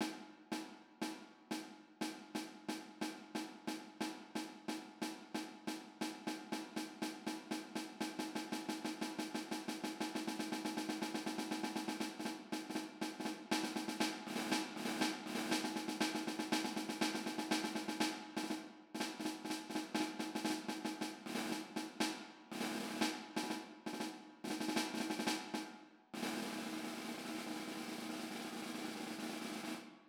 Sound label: snare drum